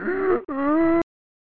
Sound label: "Gasp... Oooh..."; theme park 1995 snes